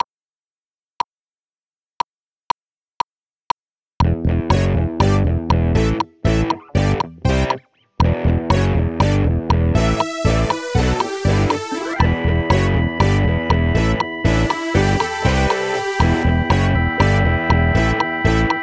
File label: Test Run Keys